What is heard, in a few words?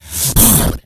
rage, infected, male